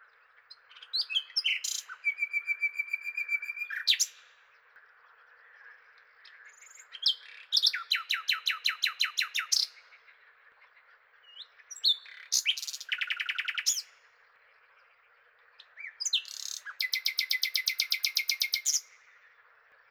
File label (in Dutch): Nachtegaal